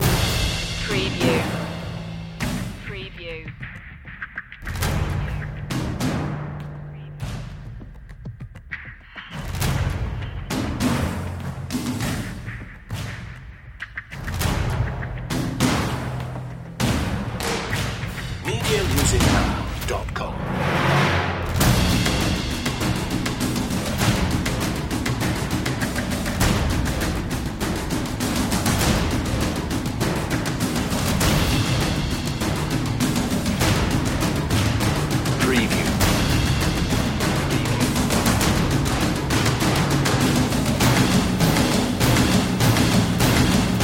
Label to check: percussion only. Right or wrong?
Right